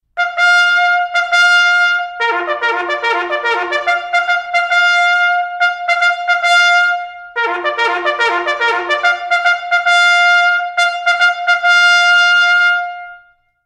Single File aka To Arms, cycling bugle, Bugle Calls; c1880 Cycling Bugle